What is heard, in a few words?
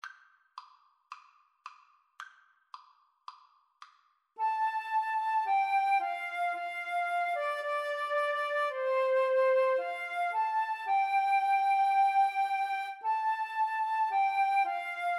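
nearer my god, brass, 2 flutes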